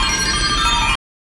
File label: tinkle